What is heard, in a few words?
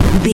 retro impact hit general